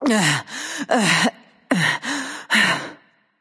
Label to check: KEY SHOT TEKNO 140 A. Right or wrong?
Wrong